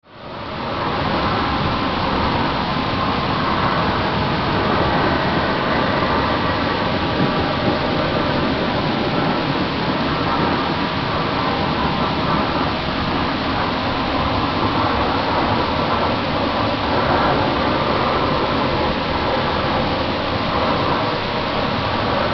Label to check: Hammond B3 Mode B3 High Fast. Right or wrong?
Wrong